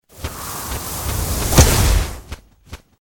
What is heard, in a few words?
attack; hero sound